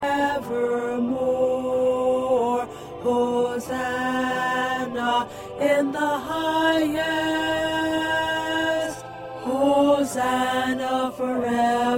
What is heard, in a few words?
2nd Tenor